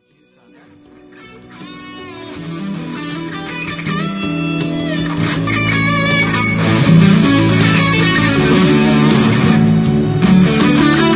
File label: melodic soloing, guitar